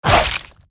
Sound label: Killer Slug Attack